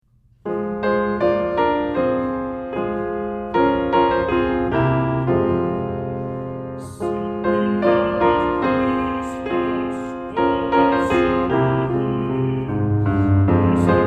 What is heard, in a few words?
Piano; Sing We Now Of Christmas; piano accompaniment pieces